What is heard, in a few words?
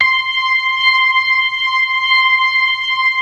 ORGAN, 4 DRAWBARS; Keith Emerson Signature Series